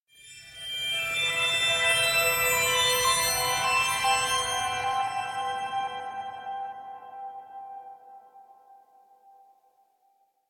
Reversed High Chimes Light Mystic Melody - Musical; Hollywood Edge; sound designer's toolkit v2